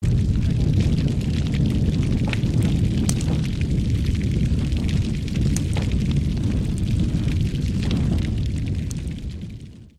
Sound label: Large Fire; SoundsCrate